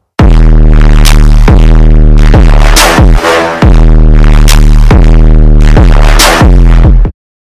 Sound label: drop bass boosted